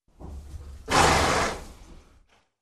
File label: Horse Bray heavy